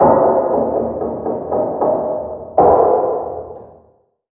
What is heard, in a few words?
Random Single Hammer